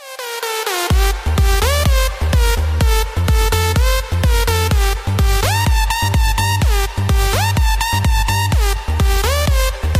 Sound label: when the beat drops